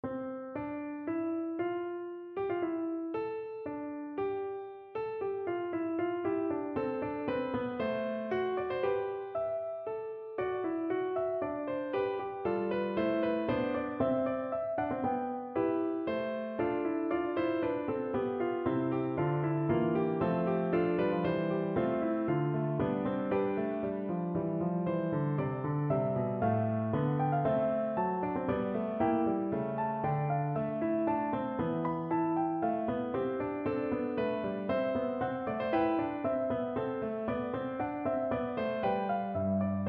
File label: C Major Fugue, piano; Bach